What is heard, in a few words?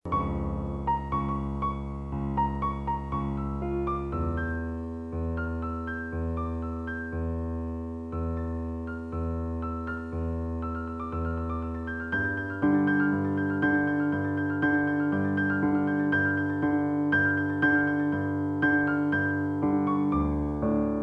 Piano